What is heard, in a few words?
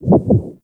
Infested Jetpack Heart Beat; Warframe